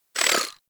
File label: Pistol Proj By; Guandao